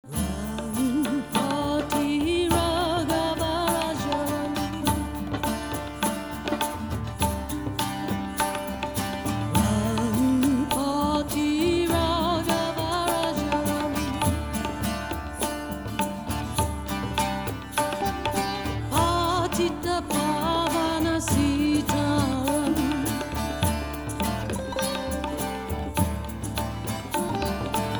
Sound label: Indian Chant Dance